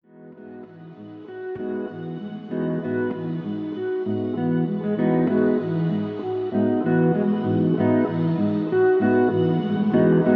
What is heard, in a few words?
NYLON VICTORY VICKIES MELODY 97 BPM